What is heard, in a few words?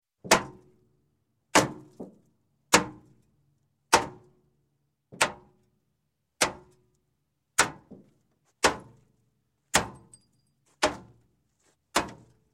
Metal, Bang Hammering Automotive Bumper; Sound Ideas 2000 Series